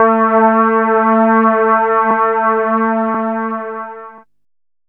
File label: DR. LOOP, TRANCE; X-Static Goldmine 3